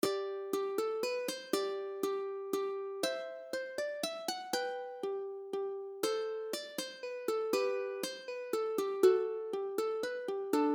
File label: Bach Minuet Uke Low G; Classical Uke